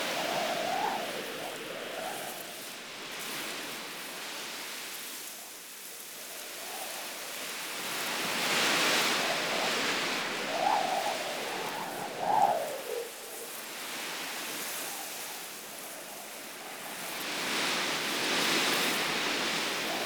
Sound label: Wind Grass Medium Loop; Ambience; Props; Warframe; Eidolon Plains